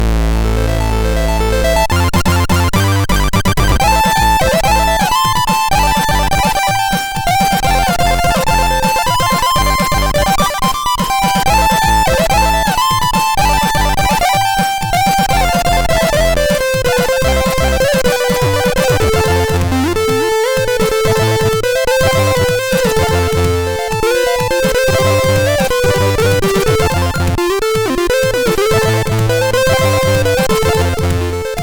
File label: tune, MOS6581R3